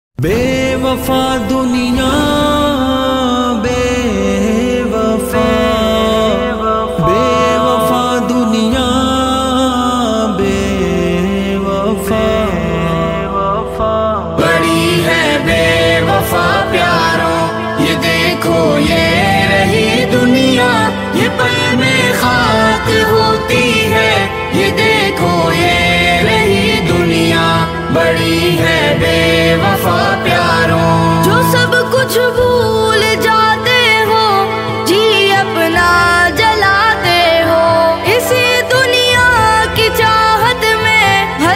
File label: Emotional Kalam Ringtone